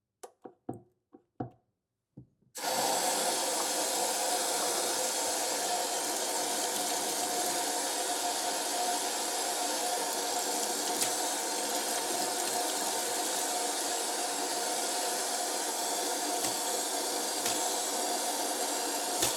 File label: Sanitize; TheWorkRoom Audio Post